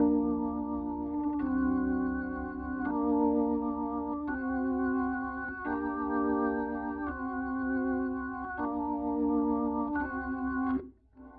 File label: ORGAN